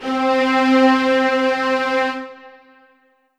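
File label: Ensoniq ZR-76 Legato Strings C4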